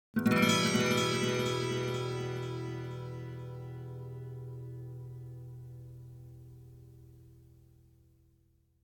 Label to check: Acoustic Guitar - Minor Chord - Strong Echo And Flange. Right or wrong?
Right